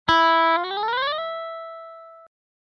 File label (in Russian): zvuk neudachnog momenta gitara